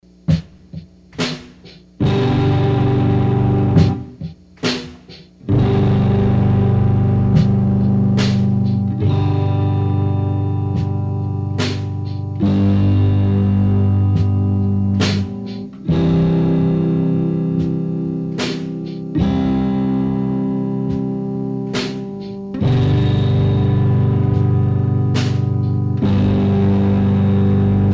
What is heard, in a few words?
Drumstrum